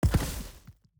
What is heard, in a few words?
environment block digged up